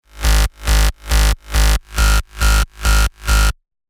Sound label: Reversed, techno bass; Spire; revealed spire techno bass vol 1